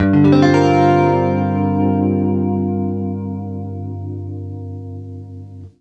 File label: CLEAN GM9, Guitar; East West; Steve Stevens Guitar Samples Collection